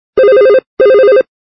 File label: Melody, ringtones